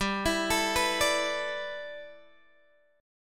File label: G 6th Add 9th, Guitar, Open G